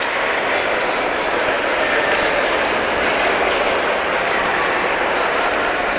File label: crowd